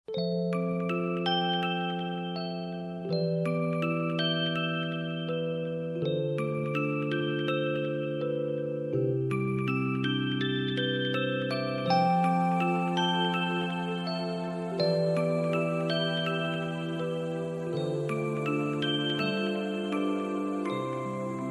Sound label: Music Box Heart